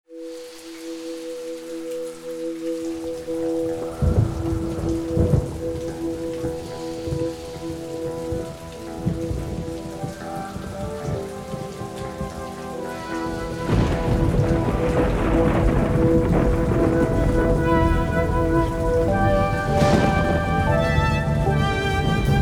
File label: electric guitar works